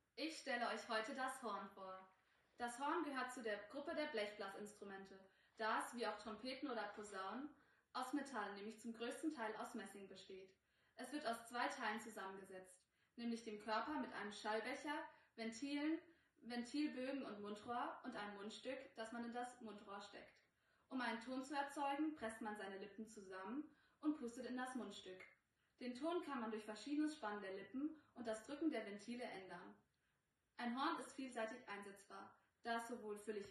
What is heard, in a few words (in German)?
Horn